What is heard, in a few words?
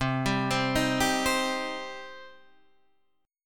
C Minor C down; arpeggiated; JGuitar